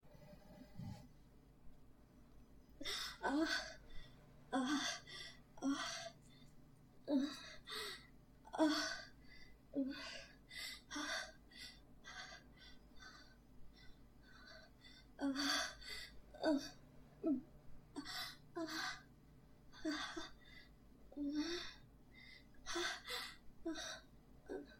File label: moans and whimpers, and screa; Quickie before work; [starts with insertion]; [moans and whimpers, and screams, oh my]; [slapping sounds I t